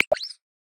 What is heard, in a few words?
Kinkajou; Xiaomi Redmi-Note-8-Pro